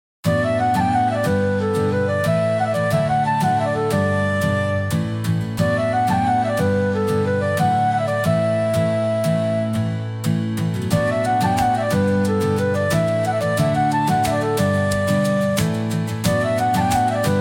Bamboo Lantern Dance, light and japanese pop for festivals; bright everyday use